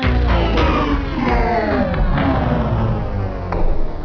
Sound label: big stop, plats; svencoop; cracklife